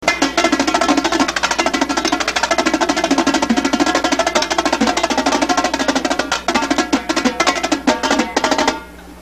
quads, drums; percussion

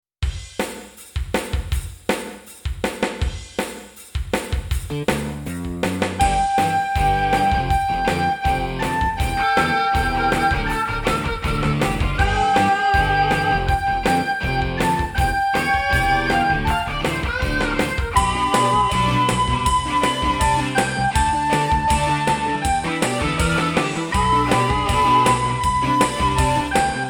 melody

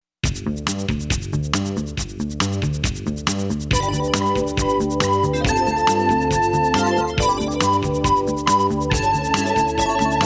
Starburst melody

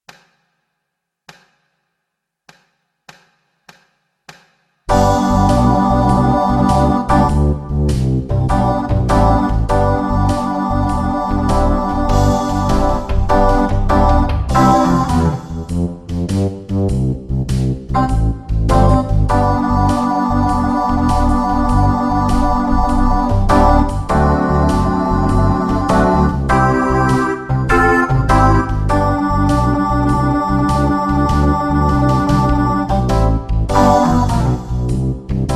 Basic Blues in G (2x)

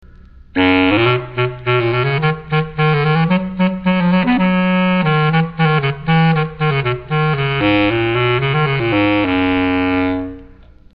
clarinet bass; woodwind sound clips

